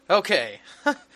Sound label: wtf laugh